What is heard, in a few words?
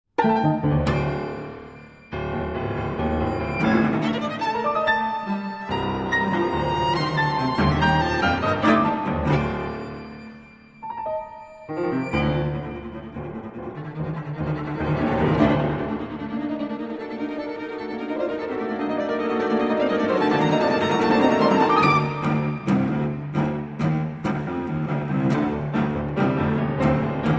trailer music